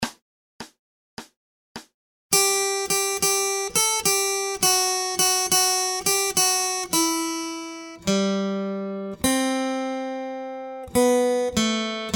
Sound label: Acoustic Accompaniment, acoustic guitar